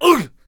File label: Hwacha Attack Vocals